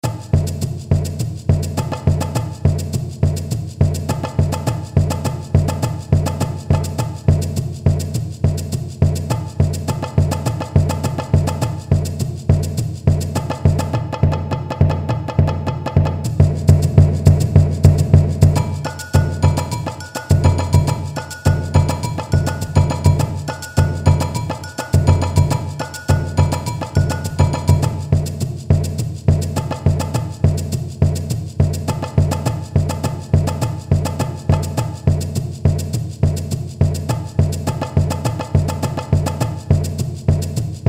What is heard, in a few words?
BAHICADA BATUCADA Tamburim Surdo